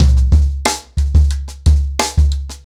90BPM, ACOUSTIC DRUMS

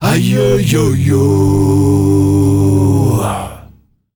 male 5ths, S. African world voices; ILIO; Vocal Planet VOL-5

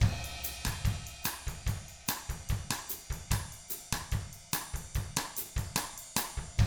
BOSSA, Drums

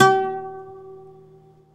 OUD G 4

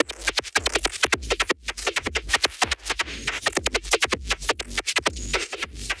perc suction perc kit; Polyend; Recue; percs glitches and layers

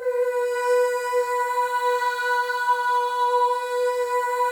MOVING V2, Symphony of Voices; Spectrasonics

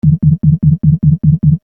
Syn Bass 150, Techno Synth Loops; SynBs; 150bpm; Sonic Foundry (Sony Creative Software); Signals I

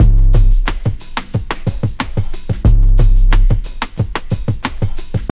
TRIP 90, trip loop

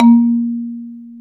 XYLO A#2; AKAI S-Series CD-ROM Sound Library VOL-8

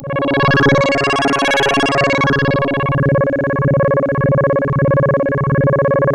C#, Synclav FM; Big Fish Audio; SPACE1

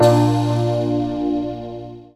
SYN Nice Chord; Best Service Gigapack 1

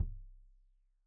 Kik Stereo; Deeply Sampled Drums; SMDrum

